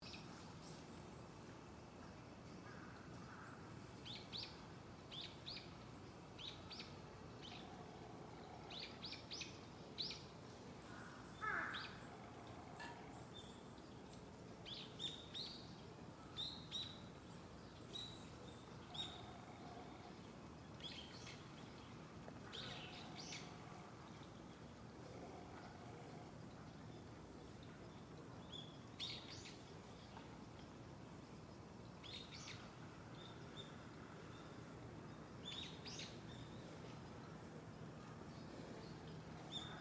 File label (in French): Oiseaux, Kochi; Sounds of Kochi